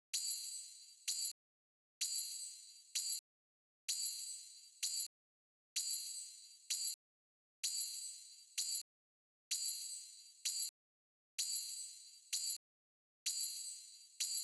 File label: tambourine, Key D# Bpm 128; Big Room House; Loop Nerds; Big Room House Allstarz